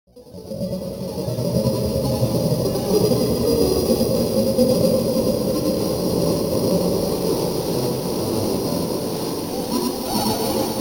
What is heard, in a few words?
Blofeld; Waldorf